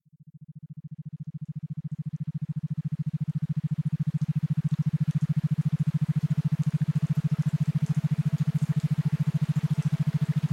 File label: Quick Relaxation Rainfall; Mindacademyir